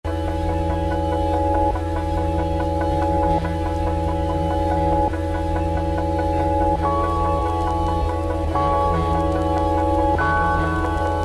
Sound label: Original Instrumental